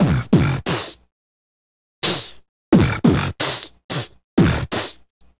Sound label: Beat Box, Hip-Hop and RnB Vocals